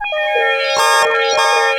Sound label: PPG ARPEG; Greytsounds VOL-1